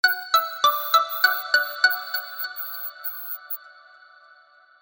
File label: kids music song sms ringtone